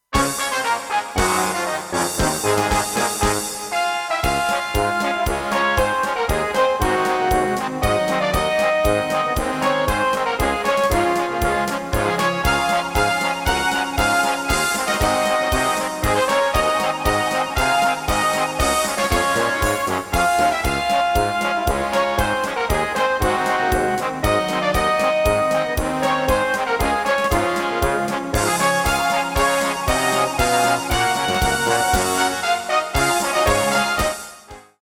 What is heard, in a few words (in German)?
Blasorchester